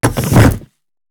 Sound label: Gear Shift Strong; 换挡4